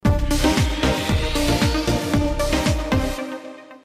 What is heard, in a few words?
signal sms, ringetone; TV2